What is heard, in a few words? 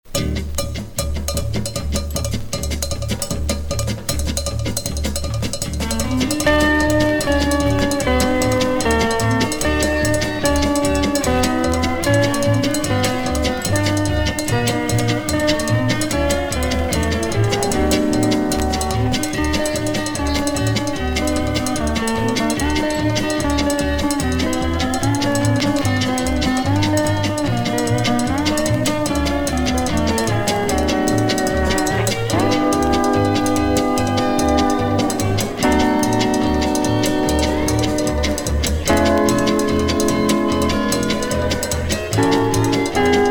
backing tracks